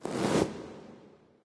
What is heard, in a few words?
armor; smite; poseidon